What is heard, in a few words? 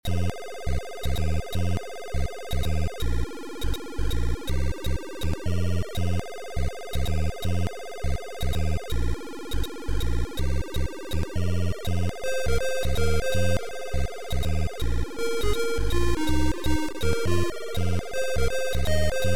bass reflection